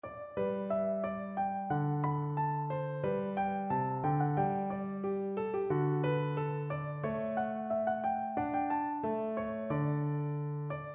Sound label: bouree piano; handel water